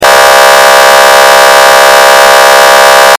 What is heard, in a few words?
HORN